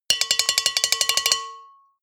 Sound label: bowl hits rattle fast long